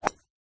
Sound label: Golf Drive Wd